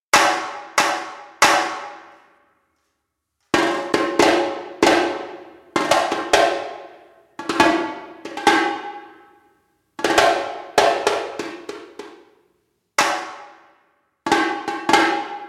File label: repinique single hits room